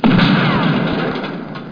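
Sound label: shotgun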